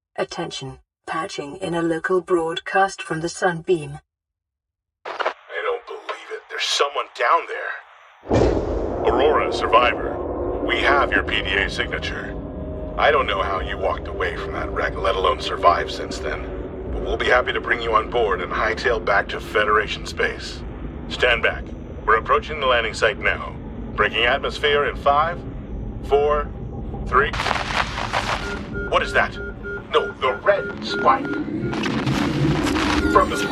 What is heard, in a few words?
Sunbeam Destruction